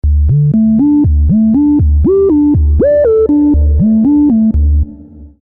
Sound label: vco sin